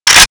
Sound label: ak bolt down